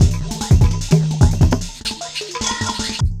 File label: Weird Beat, Drum N Bass; Sonic Foundry (Sony Creative Software); Knutrix Drum & Bass